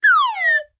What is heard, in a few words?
fall